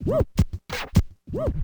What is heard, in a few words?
scratch, DJ Booth